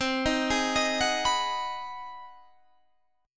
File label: G sharp 7th Sharp 9th